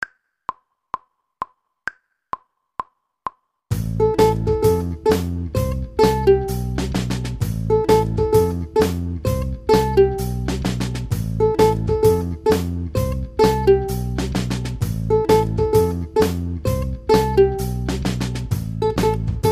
ukulele

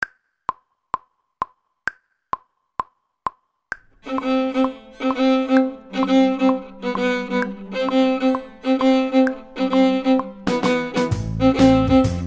viola

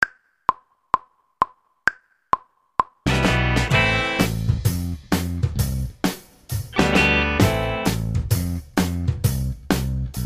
guitar

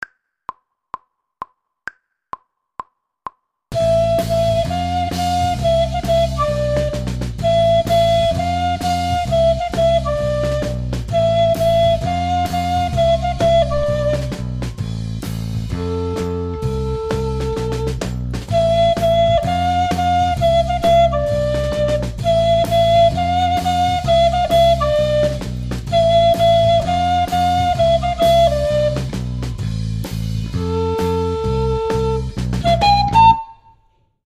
treble recorder

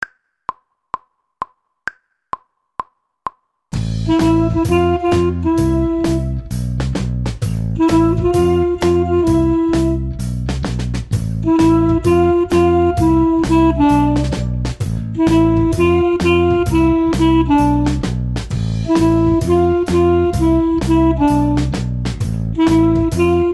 clarinet

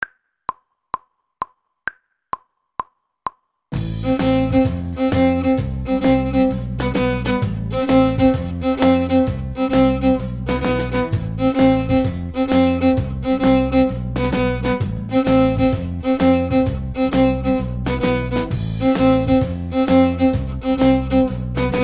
viola

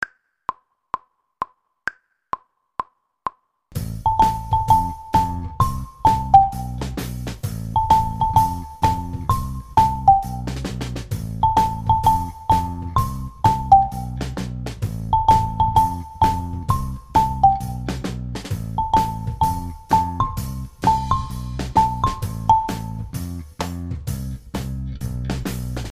tuned percussion

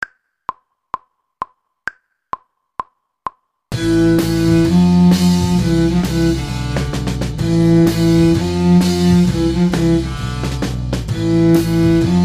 cello section

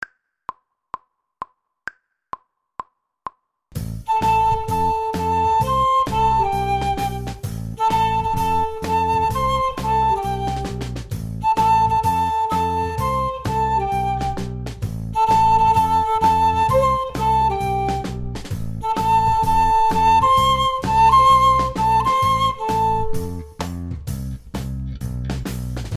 flute

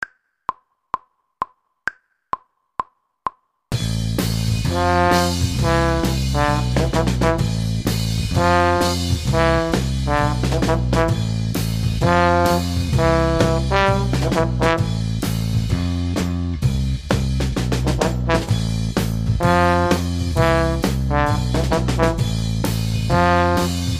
baritone section

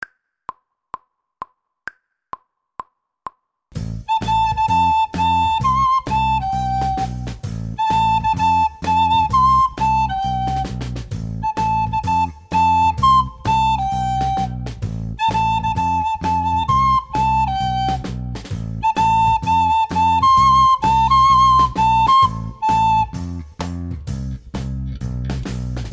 descant recorder

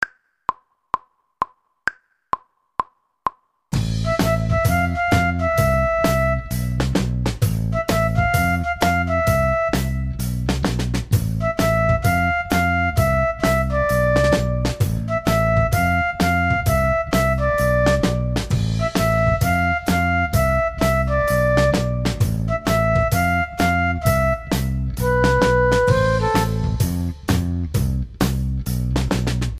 keyboard section

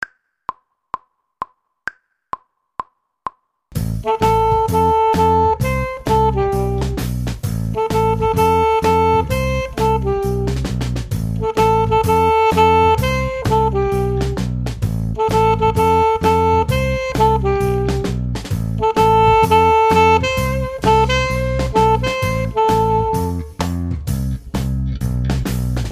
alto sax; alto sax 1

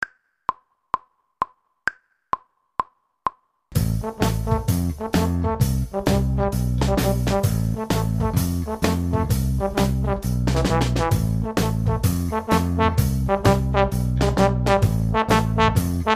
trombone section

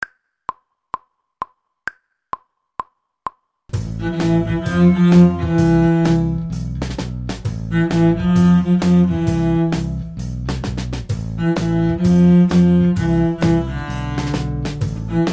cello section

